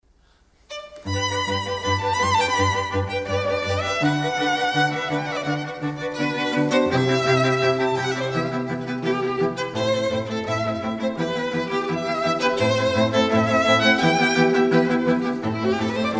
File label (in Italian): Violino